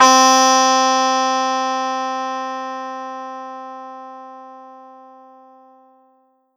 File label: Roland SC-88 Sitar C4